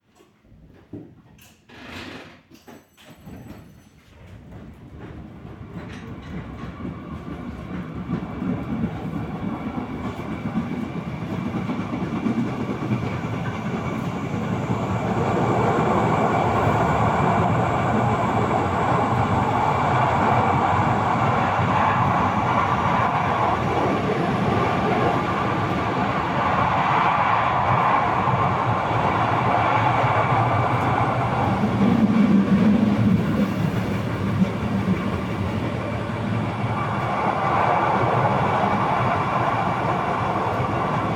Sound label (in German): O Wagen Fahrsound